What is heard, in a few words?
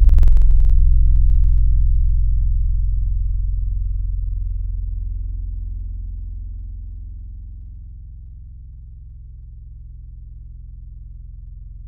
Lofi Piano; Synthetic's Serum Collection; Surge Sounds - ASTRO - Serum Wavetables